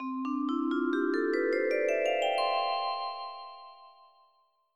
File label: TUNE